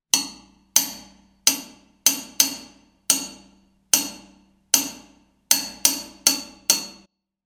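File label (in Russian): стук по батарее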